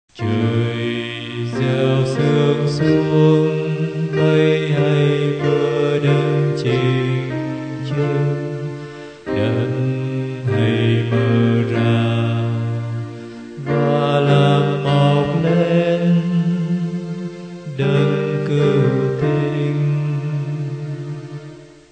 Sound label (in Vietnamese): ALTO